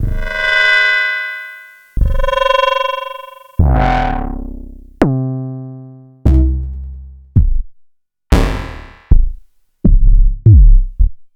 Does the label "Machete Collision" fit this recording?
No